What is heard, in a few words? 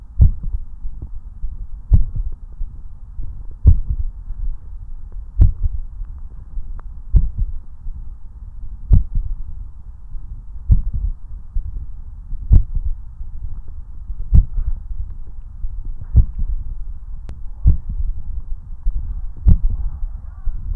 Bradycardia; Molly-Ann